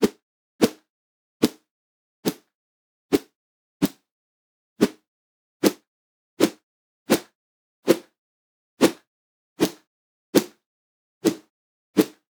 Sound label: Swipes